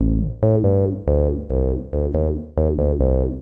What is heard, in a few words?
140 weak synth bassline